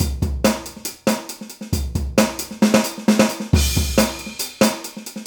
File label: Dnb studio fill